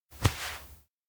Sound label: shoulder pat soft; anemoi